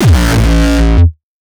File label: kick; shock. Ultimate Kicks vol. 2